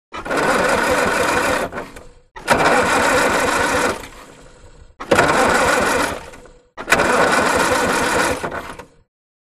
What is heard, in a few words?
Automobile; False Start; Austin Ruby Trying To Start.